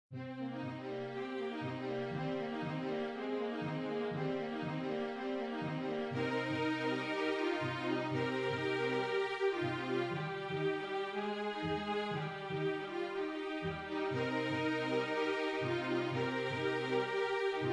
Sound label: string quartet